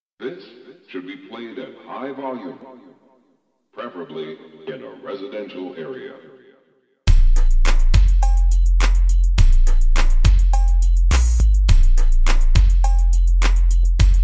bass boosted; Bassotronics

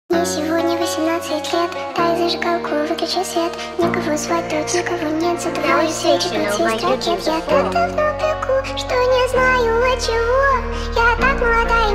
remix car music

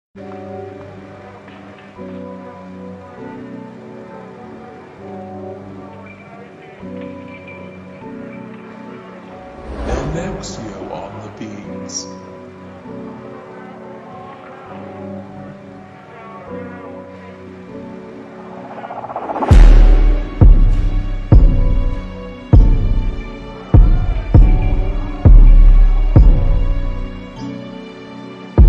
slowed reverb

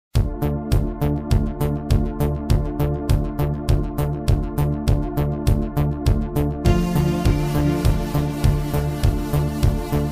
korg pa900 modern beat; Sergey K

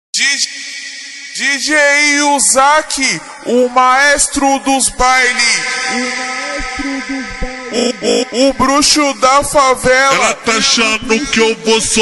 montagem slowed reverb

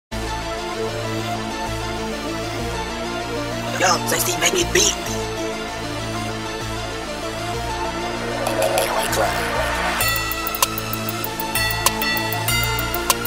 type beat

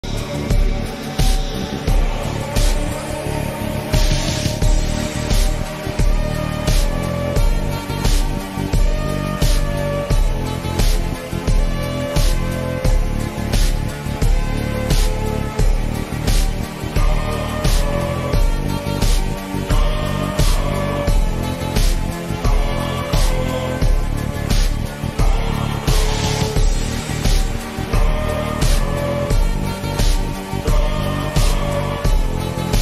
slowed reverb

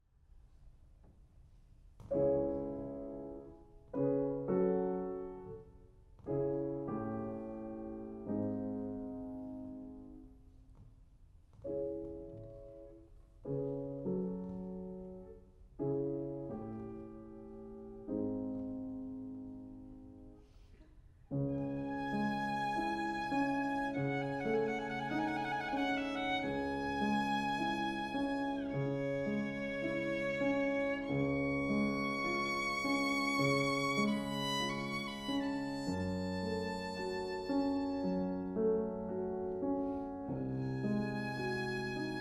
Nocturne in c sharp minor for violin and piano; F. Chopin

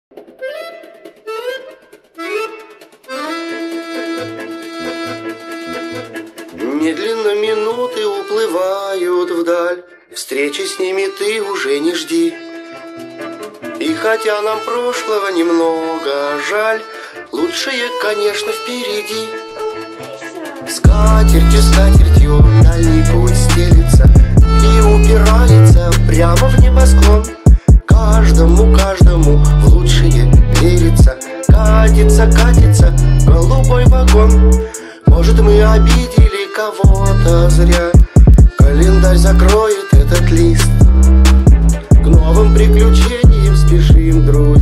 trap phonk remix

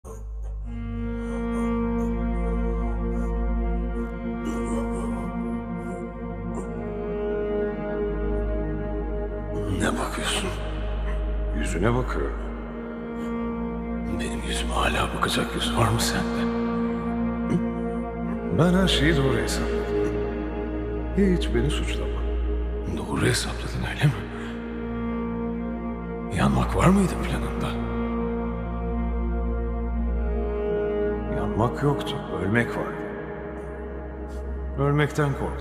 rock slowed reverb

